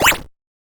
Get Item 8 Bit

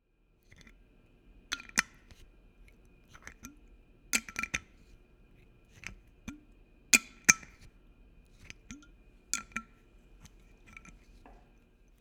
Glass Bottle

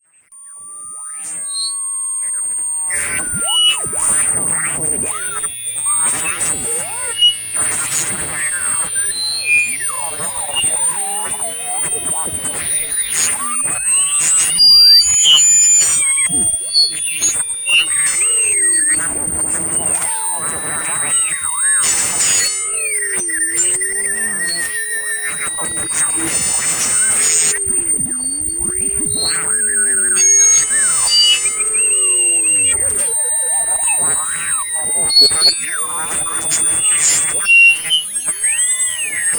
Feedback And Resonance

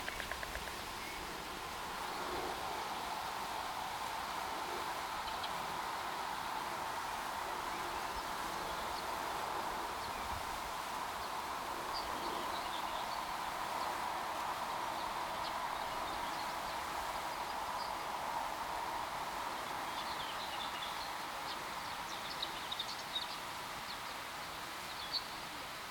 Storks

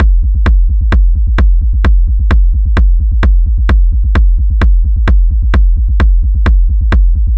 Dark Techno Rolling Kick Loop

